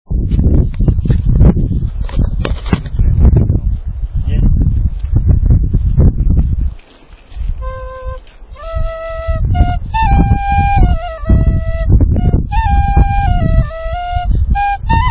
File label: flute